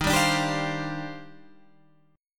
Eb Major 13th, Guitar, Standard; EbM13; strummed; JGuitar

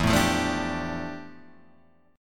F Major 13th E, guitar chord

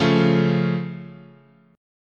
C sharp Augmented, guitar chord

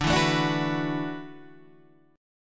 E 9th Suspended 4th D, guitar

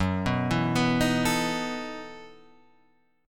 E Major 7th Suspended 2nd Suspended 4th Gb

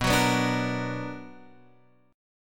B Minor Major 7th, Guitar, Open F; strummed; JGuitar

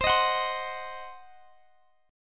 C Diminished, chord; strummed; x,x,22,20,19,20

